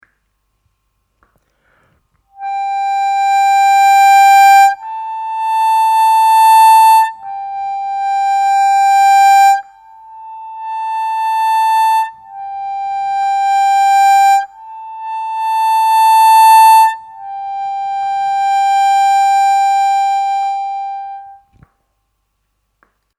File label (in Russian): +6-6, губной гармошке; одиночными нотами